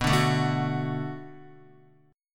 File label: B Minor 7th B Guitar, chord; Bm7; strummed; JGuitar